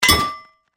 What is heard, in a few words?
Metallic Hits, Production, Element, Metallic, Hits; Warped (Sound Effects:Sound Design); BlastWave